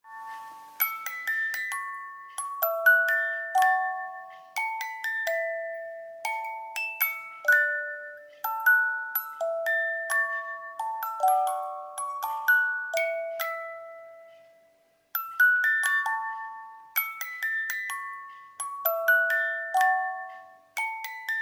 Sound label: Music Box